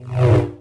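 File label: sith lightsaber Swing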